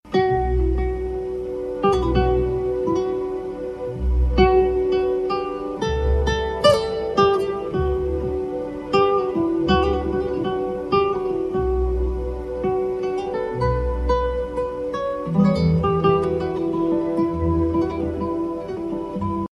Guitar

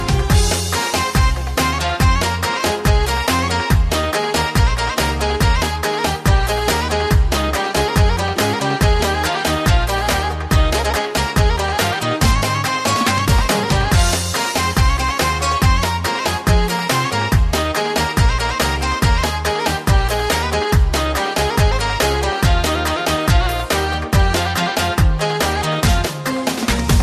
POPULAR DANCE ROMANI

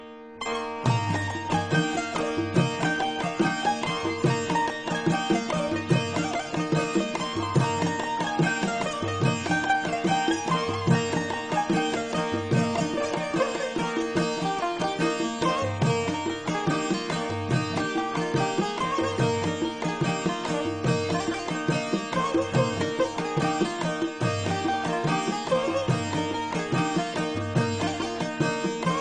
Balochi Music

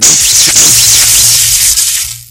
Electric Thunder

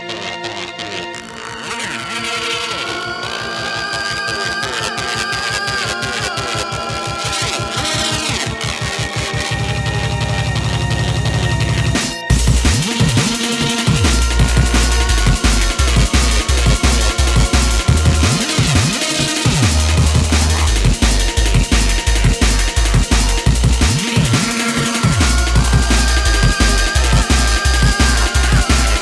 Deep Beat

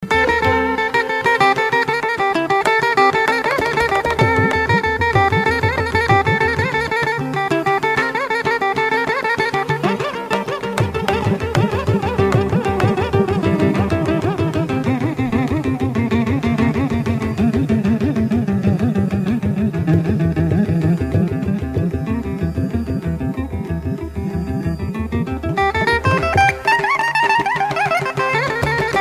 Mandolin

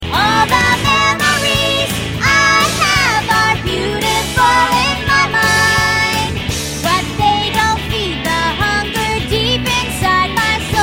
Chorus

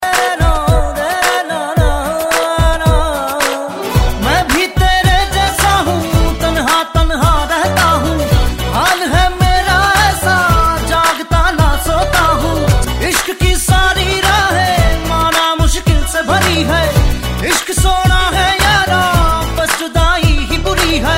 Tone

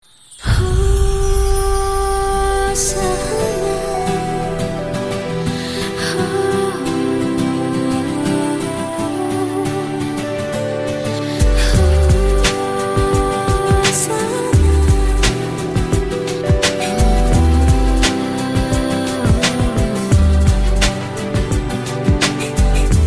Bgm

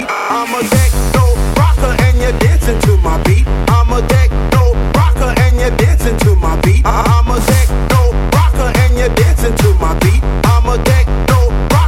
Techno Rocker

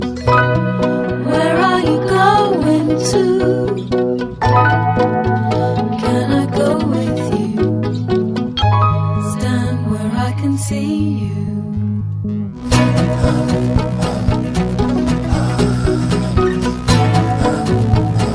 Samsung Wave Tune

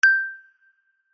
Ding

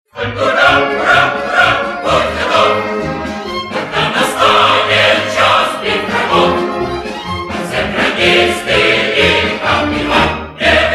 Sports March

